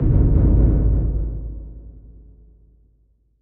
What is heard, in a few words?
damage hits explosions, Warships